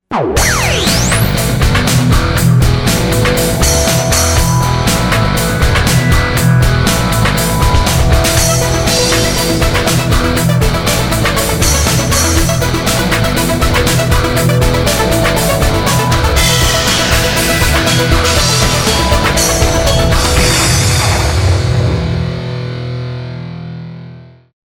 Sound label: Electro Rock